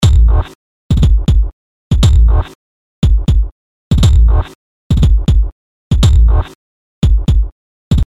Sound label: lofi